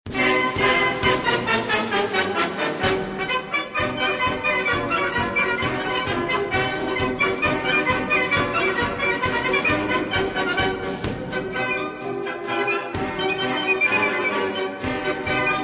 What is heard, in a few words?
White Rose March; Jubilee